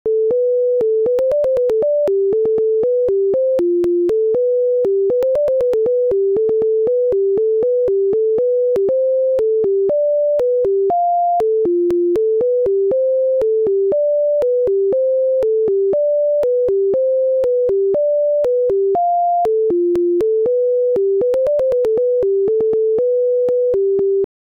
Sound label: Highland Reel